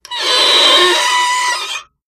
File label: Chalk Screech Blac, squeak